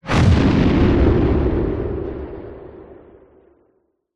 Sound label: sound design cinematic hit explosion wind; zapsplat